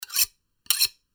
knife sharpening

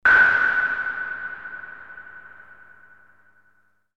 sonar

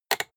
rotary control short quick turn hard

